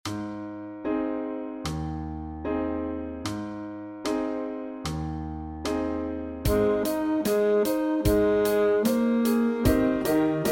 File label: Sax Study